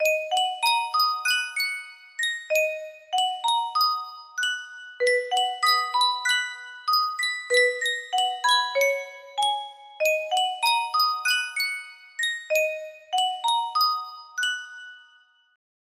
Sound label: yunsheng custom tune music box